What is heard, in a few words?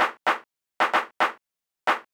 Linndrum Break claps; 112BPM